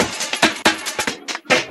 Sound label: Loops Fills; Vengeance Samples